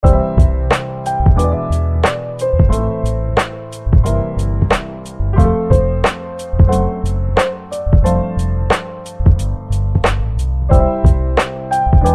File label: Smooth Keys and Guitar